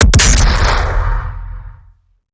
bolt action mastery

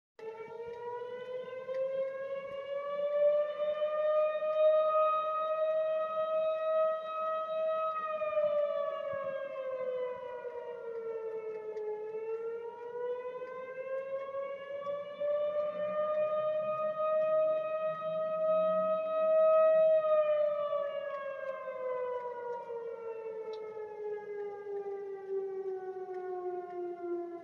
storm siren

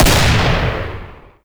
auto shotgun fire

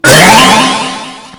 tesla charge

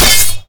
ubersaw hit, weapons

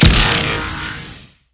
railgun fire

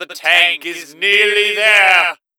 tank alert; gray mann; mvm

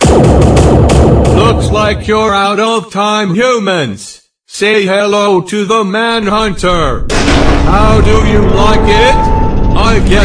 alien

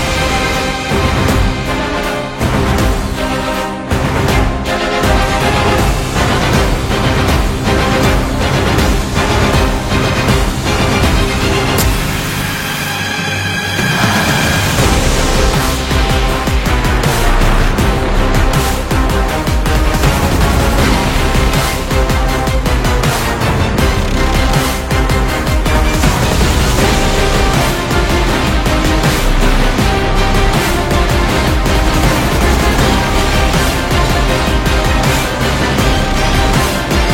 boss phase 2 transition; canto9

